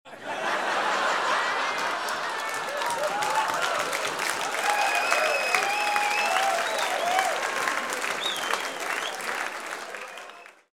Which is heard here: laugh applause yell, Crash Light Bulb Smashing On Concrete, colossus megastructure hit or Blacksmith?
laugh applause yell